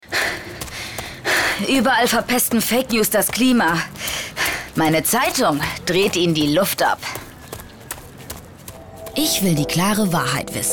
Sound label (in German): Klima Funk mit Jingle